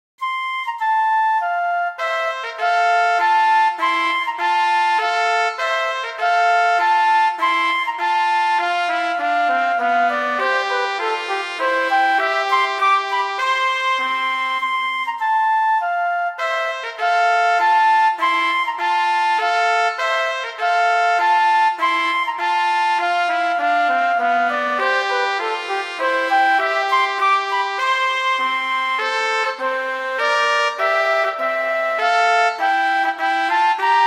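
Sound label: Duet, horn; Mozart; Duets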